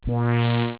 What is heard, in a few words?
bwaaang